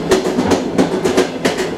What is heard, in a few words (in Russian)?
stuk, train rail; Factorio Paranoidal mod; newtrainsfx